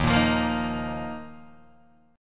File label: Eb Suspended 2nd, Guitar, DADGAD